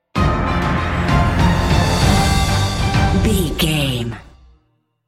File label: epic cinematic sting bumper